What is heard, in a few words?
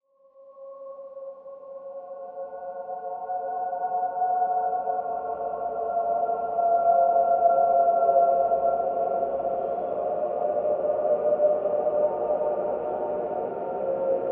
Choir, Salzburg